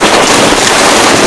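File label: SNOW SKID; mtm2demo; PC Gamer 4.2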